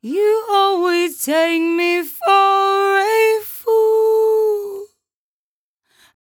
57bpm Am, Velvet Vocal; Zero-G

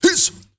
MALE RAV, MALE NOISE, Heart of Africa; Spectrasonics; Heart of Africa 1

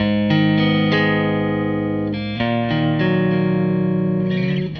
Strat Guitar Loop

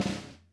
Ludwig Ruff; EMU PX-7

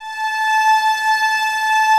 STR VAS A, Violas, No Vib; Miroslav Vitous

